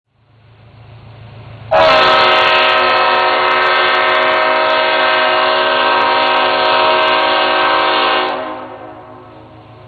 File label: horn